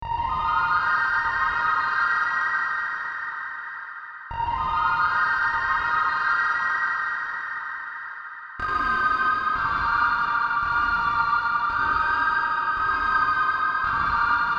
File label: Synth Pluck